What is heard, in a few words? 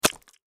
Impact Mud Hit Moist Surface; Low Impacts; Sound Effects:Impacts